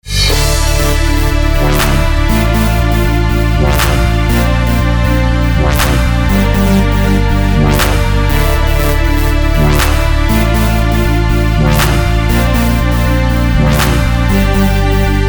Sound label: Strings and Beat; dramatic electronic